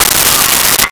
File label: Metal Slide